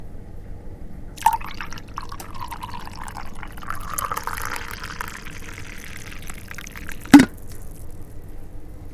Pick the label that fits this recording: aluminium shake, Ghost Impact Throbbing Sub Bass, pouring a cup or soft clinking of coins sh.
pouring a cup